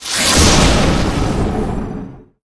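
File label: goblin mage buff skill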